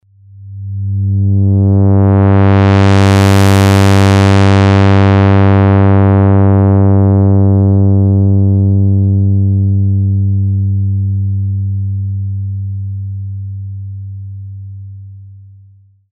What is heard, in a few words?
filter ADSR